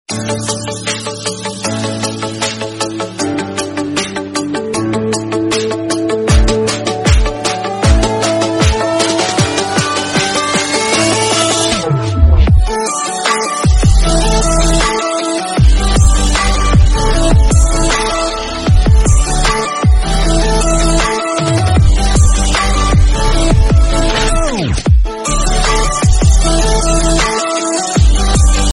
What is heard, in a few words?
sweet music tone ringtone; samsung